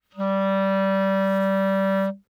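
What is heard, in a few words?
Eb Clarinet fortissimo G3; Woodwinds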